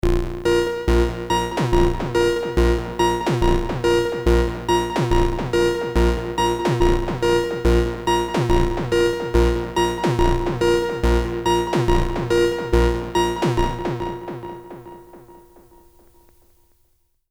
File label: T REX Replicator Module Moog short long Delay